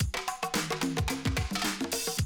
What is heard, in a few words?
drum loop, 100 BPM